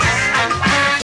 horn fanfare

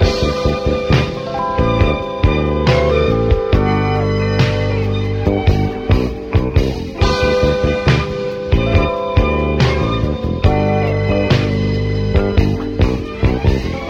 full, Loops Phonky

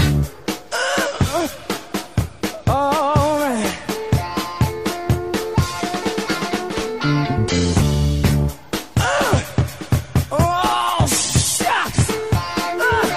Drum loops, Funky

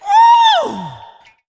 woo

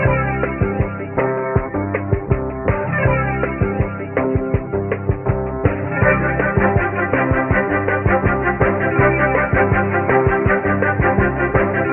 keys and strings, loops, phonky

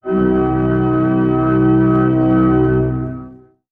church organ; Notes

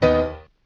bing

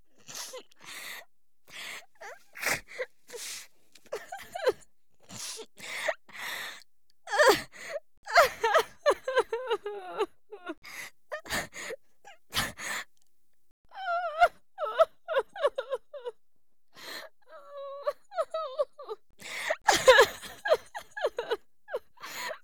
crying woman

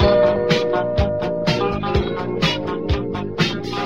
keys and geets of fonk, Phonky loops

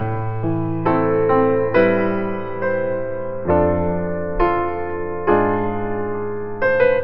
piano, Loops Sad

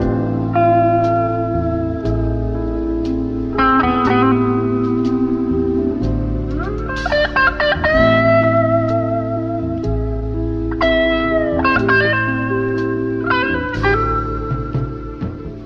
guitar, Sad

